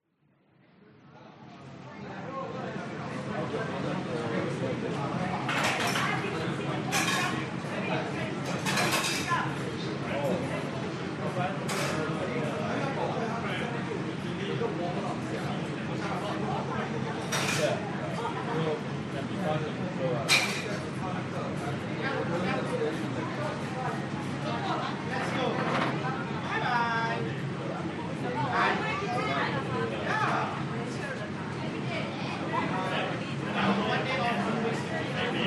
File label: restaurant busy people families walla chinese fish head singapore